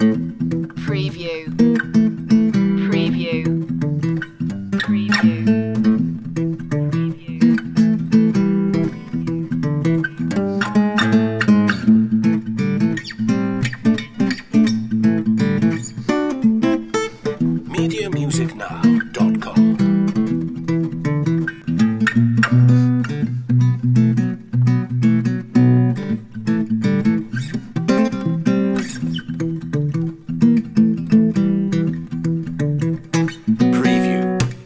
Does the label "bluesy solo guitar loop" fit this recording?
Yes